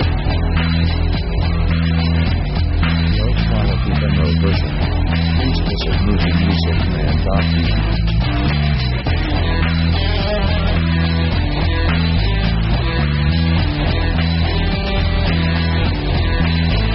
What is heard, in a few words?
rock me, slow